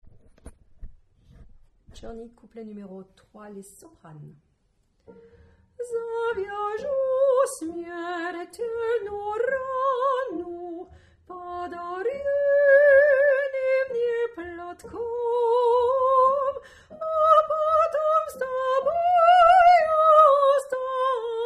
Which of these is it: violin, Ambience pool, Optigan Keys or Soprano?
Soprano